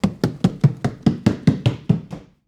Footsteps Wood Stairs; HauntedBloodlines

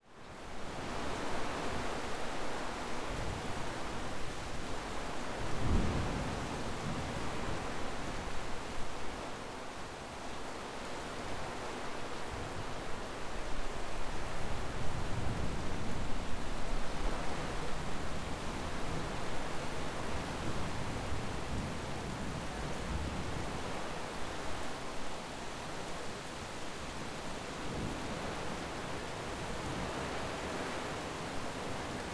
Thunderstorm Window

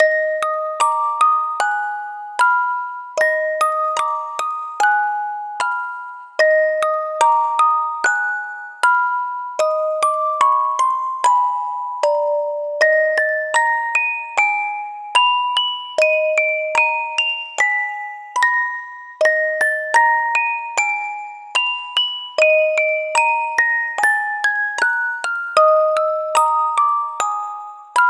Lullaby, horror music box; HauntedBloodlines

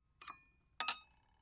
Spoon Saucer; Eating; HauntedBloodlines; EatingCinematic; SFX Producer

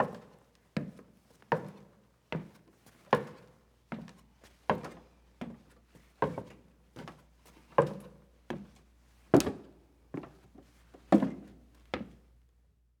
Stairs Down Wood; HauntedBloodlines; SFX Producer

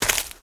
STEPS Leaves, Walk; High Quality Footsteps

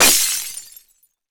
Impact Glass Smash; MinorHallutinationsSounds; SFX Producer

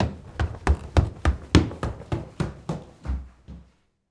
Stairs Up Wood; Footsteps; HauntedBloodlines; SFX Producer

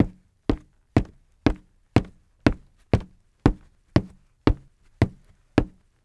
Footsteps Wood; HauntedBloodlines; SFX Producer

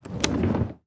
Drawer Open; Haunted Bloodlines; SFX Producer

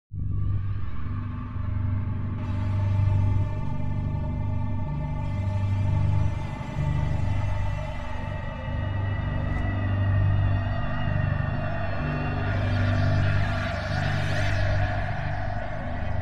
Creeping STEMS INSTRUMENTS

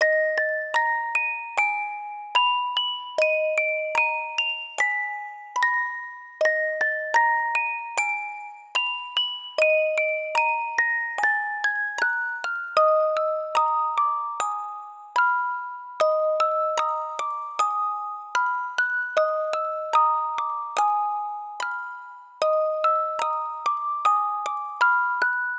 Lullaby Theme, HORROR MUSIC BOX; HauntedBloodlines

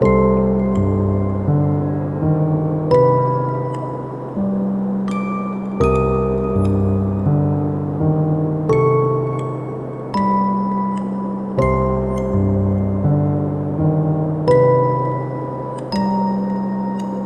Mysterious Celeste; HauntedBloodlines; HORROR MUSIC BOX

